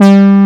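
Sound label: horn G2, synthesizer analog horn; AKAI S6000 CD-ROM - Volume 1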